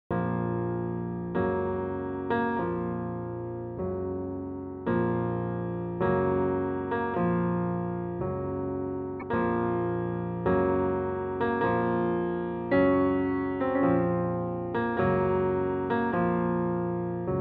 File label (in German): Klaviersonate b-Moll; Chopin; Nr2; Op35; Piano Sonata No.2, Op.35